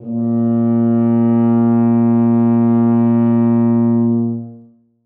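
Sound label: tuba sustain a#2; Sonatina Symphonic Orchestra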